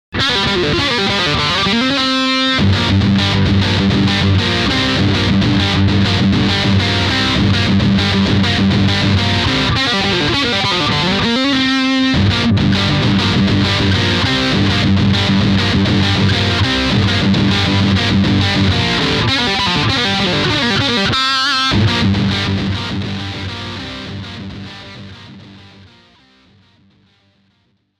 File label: Classic Rock Crew Riff, gitar